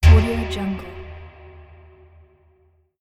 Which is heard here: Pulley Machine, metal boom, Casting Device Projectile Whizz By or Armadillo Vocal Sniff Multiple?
metal boom